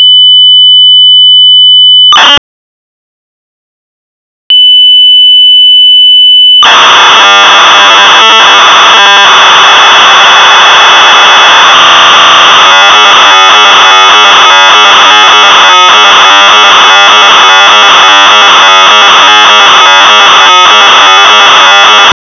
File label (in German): STANDUHR